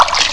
Fish Leap; Glider PRO

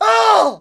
plyr ht; quake; halo; hurt

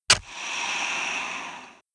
croc die; Immortal

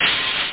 open hihat

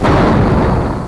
Explosion; roTyx 1.02

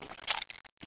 splut; alienbreed3d2

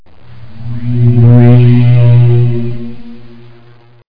Put Page; Myst Preview

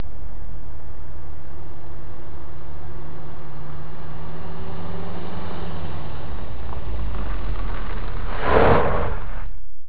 auto

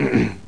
clear throat

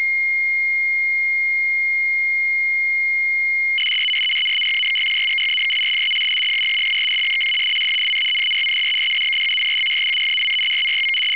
rtty150

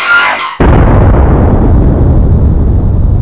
ECM Hit; Kongbots

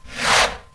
rocket; Mega Game; Team Alligator

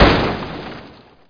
COLLIS; Prangs

